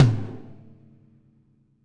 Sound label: R B Rack Tom A Close; Toms; Sonic Foundry (Sony Creative Software) - Drums from the Big Room The Mixes; R&B One Shots